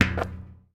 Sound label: Street Ball Metal Basket; SFX Kit